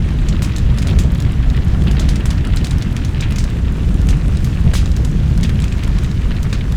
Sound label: fire no smoke; WAR